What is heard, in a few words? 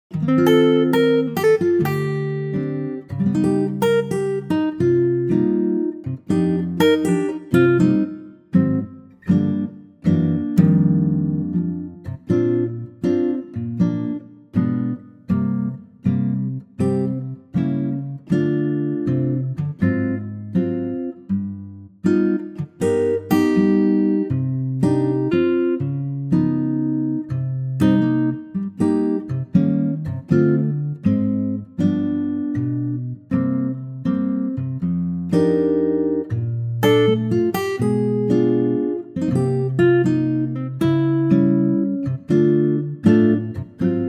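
Bb acoustic guitar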